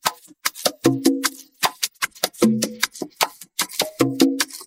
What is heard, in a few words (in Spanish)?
PERCUSIONES; multitracks